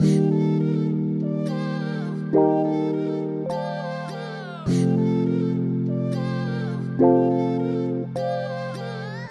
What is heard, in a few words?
Chord